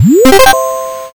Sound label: boot